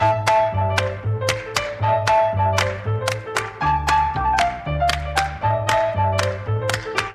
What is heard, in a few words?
jingl; Вострая брама